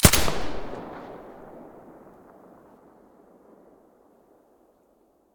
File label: silencer ump45 stereo, weapons